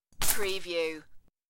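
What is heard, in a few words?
Flowers Floor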